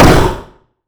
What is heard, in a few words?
explosion echo